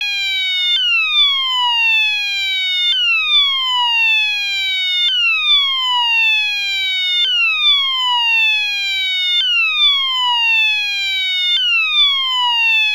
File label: ALARM, Piezo Siren